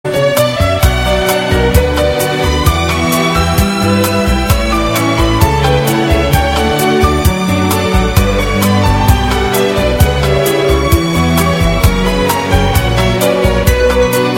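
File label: toccata instrumental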